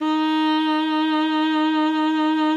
D#4, alto sax; Xsample VOL-2

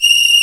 CHOIR C5; AKAI S-Series CD-ROM Sound Library VOL-2

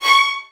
VN FX, Virtuoso Strings; ILIO; Kirk Hunter Virtuoso Strings VOL-1

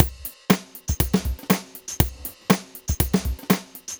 Drum Loops, 120 BPM; Sonic Foundry (Sony Creative Software); Underground UK House & Electro

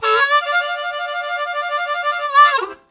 Roll, Harmonica Riffs; Zero G - Harmonica

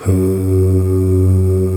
POP OOHS

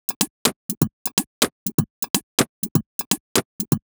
A# 124bpm, House Drumloops; Zero-G House Fabrik Drumloops

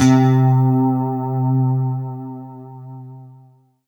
C3, Synth Line; 90 s Sample CDs; NiceBeats; SynthLine VOL-23

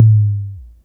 SYNCUSSION

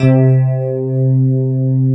FM ELP 4 C2 L, Keyboards Old School; USB Soundscan vol.09 - Keyboards Old School; AKAI

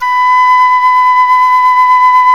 KENA; World Combo

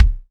LOW WOOD, SR-16 DRUMS; Drum Mashines; EdgeSounds

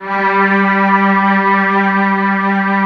mellotron G3

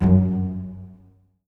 F#1, Virtuoso Strings; ILIO; Kirk Hunter Virtuoso Strings VOL-2; 10CL TRM FX

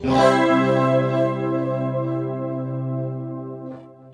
guit strum (E); Sonic Foundry (Sony Creative Software); Ugly Remnants VOL-2

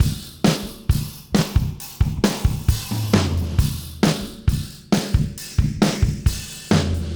Beat Loops; Zero-G; Monster Beats

